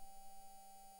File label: mic, mars2020 edlcam